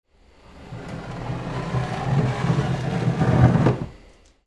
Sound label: FRICTION